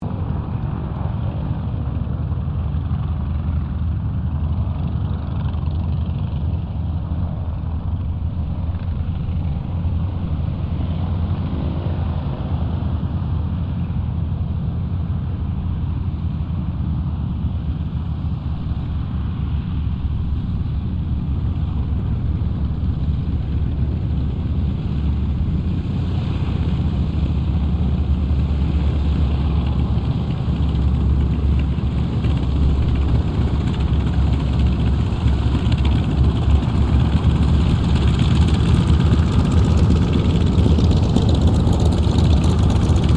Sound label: AIRCRAFT TROJAN SQUADRON 4 TAXI; Mixed aircraft squadrons; Airplanes; Sound Effects:Vehicles